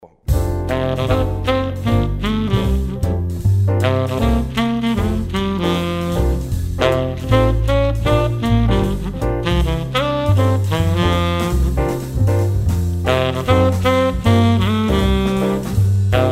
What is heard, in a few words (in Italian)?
sax e basi